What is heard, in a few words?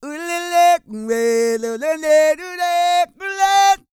SING, World Voices, CARIBBEAN